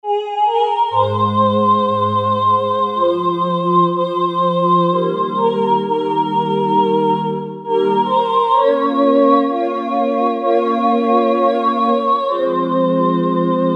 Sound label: Synth Choir, Roland U-220; Factory Patches